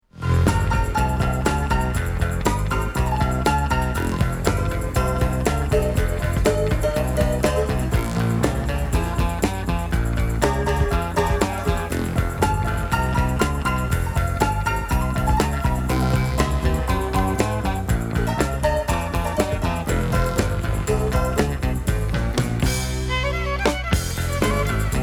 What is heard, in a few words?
rock me violin